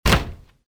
slide close, door; movers; doors; Soldier Of Fortune II Double Helix; XBOX

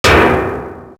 metal hollow hit; projectiles; bullethit; Max Payne

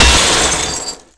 hit glass railing; hits; Tony Hawk's Project 8; XBOX

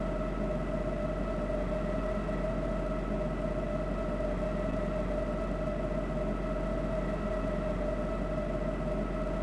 pit ambience; maul; Star Wars Obi-Wan; XBOX

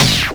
cheat select; Freestyle Street Soccer; XBOX

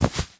hat put on; Tony Hawk's Project 8; XBOX; bedroom

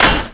ollie wood, terrains; Tony Hawk's Project 8; XBOX

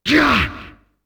ATTACK; UFC Tapout; XBOX

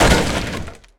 fence, Impact; Cars; XBOX

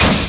bouncy ac unit large; bouncy objects; Tony Hawk's Project 8; XBOX

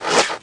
Small Spear Tail, Aliens; Small Spearleg

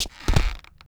switch stance, skater; Tony Hawk's Project 8; XBOX

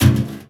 Barrel, Impact; Cars; XBOX

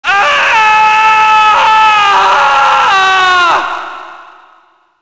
fire death; characters

